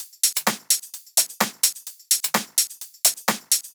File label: Drumloops 128BPM